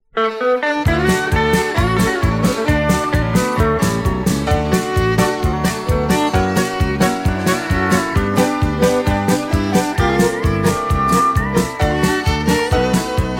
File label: music